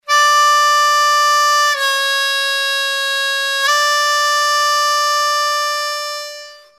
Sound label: bend, harmonica